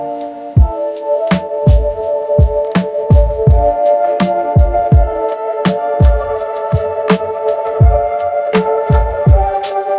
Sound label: Lofi hiphop, music; musicgen